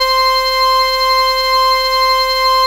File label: SIEL, String Machines